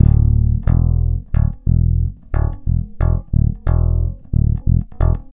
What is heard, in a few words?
Electric Bass